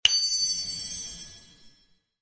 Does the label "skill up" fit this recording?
Yes